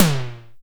TOM, ANA TOMS; Drums In Your FACE!

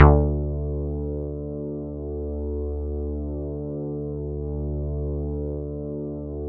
bass, synths

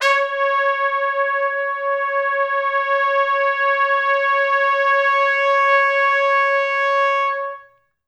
C#4 -R, Pop Brass; Best Service; ProSamples vol.19; AKAI

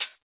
Hhat, Hihat; Equipped Music; Loungin House

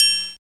SPD-8, DIGITAL

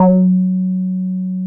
F#3, Bass; E-MU Producer Series Vol. 6 – Analogia Project (CD 1); Boom da Bass 2

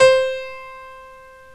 GRAND PIANO; OMI - Universe of Sounds

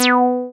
SYN 303, Roland TB-303; Sound & Vision - Gigapack I CD 2

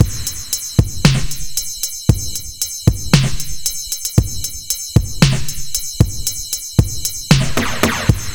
Beat Shady; Zero-G; Future Wave

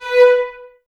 Violins Detache, B 4; Miroslav Vitous; 11 Violins

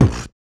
Human Perc, Body Perc

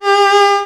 VIOL G#3, VIOLON, Arabian Traditions; USB Soundscan vol.43; AKAI

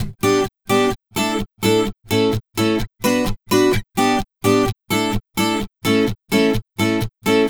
Acoustic Latin Guitar 128BPM Bmin; Acoustic Latin Guitars; Vengeance; Essential Clubsounds Vol.4; Guitarloops

